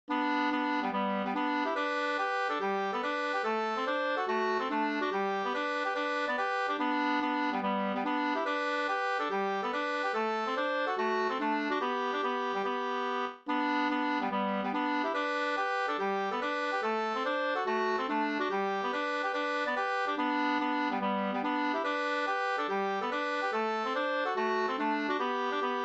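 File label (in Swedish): snoa, two-part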